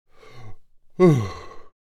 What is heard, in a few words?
Deep big male yawn; yawn sound effects